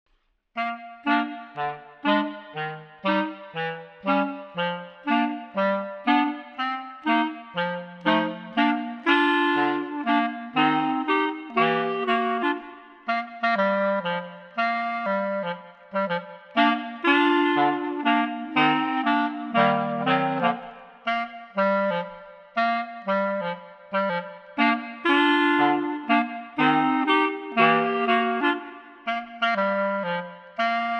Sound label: Swing Clarinet Trio